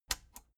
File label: plastic button latch scratch enable slow